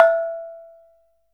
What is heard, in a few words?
CANANG E4; Spectrasonics; Heart of Asia